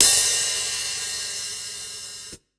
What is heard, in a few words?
Hat, breakcore Drum Hits